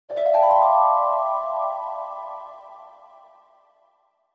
Mystery Bell

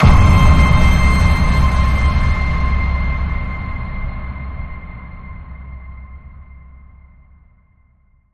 Ping Frequency

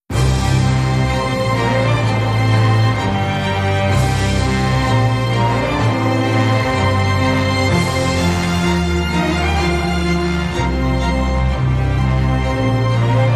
Epic Future, Light Version

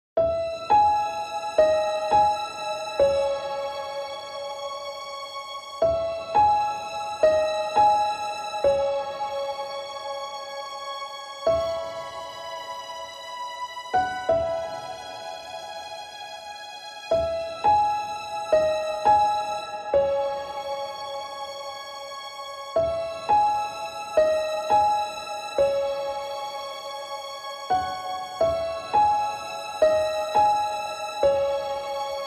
Piano and Strings Only

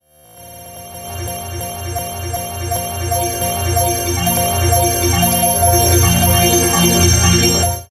Chime Pulser

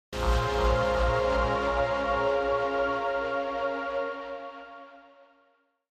Sting

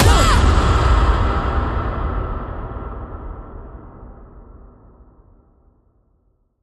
Echoes Woman Shout Hit

